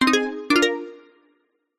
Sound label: multimedia game sound advance award nylon plucked synth